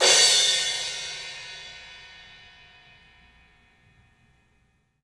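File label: CLUB CYMBALS; 3D Sound; 3D Drums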